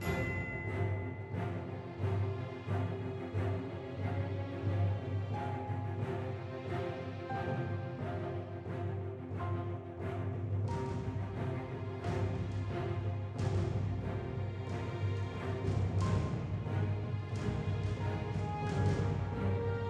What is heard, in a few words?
music suspense; Sentry Knight Tactics